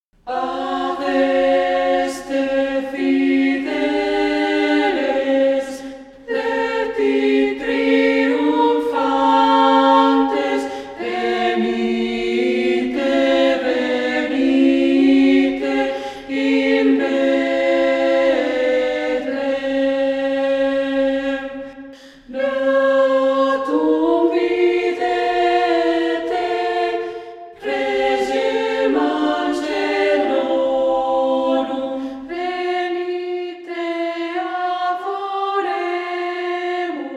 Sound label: choir test